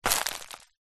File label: footstep single twigs sticks; foley